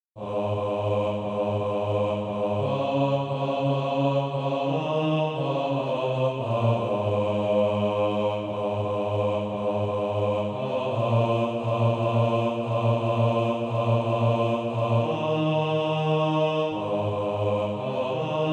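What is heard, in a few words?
bass, SATB Practise Music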